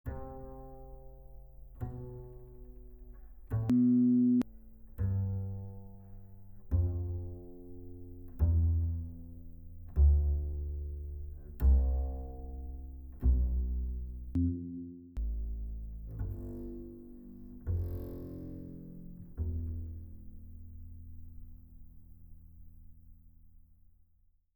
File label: Acoustic Bass Scale; Audiophile; 오디오 체크 사운드